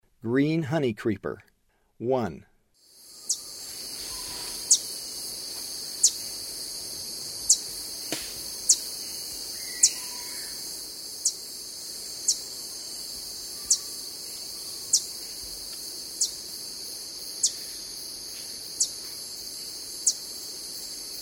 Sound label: Green Honeycreeper; S. America; Eastern Lowlands